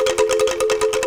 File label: F3 ANKLUNG, JAVA ANKLUNG; WorldSounds